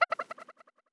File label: Drillbot change Direction